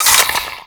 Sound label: Mech, Weapons Cannon; Kuva Zarr